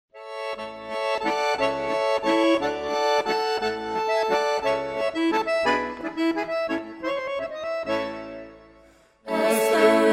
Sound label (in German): jutz